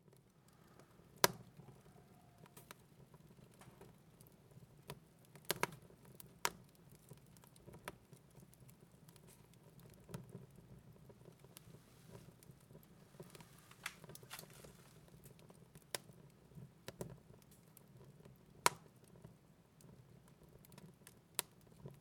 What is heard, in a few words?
Fire